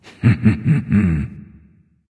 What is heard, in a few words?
"heh heh"; smite; voicepack; ullr